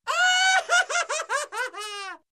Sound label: Evil Laugh